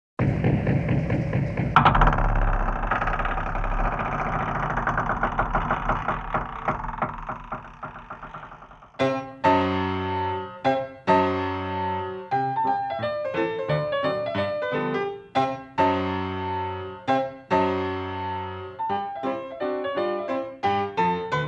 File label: a ragtime nightmare; cove city studios